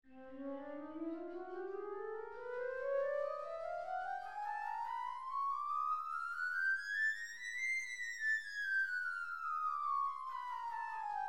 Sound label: Flute Chromatic